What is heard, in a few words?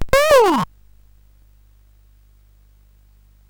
sid CSG8580R5; Smash TV